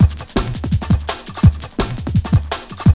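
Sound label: drum loop; X-Static Goldmine 3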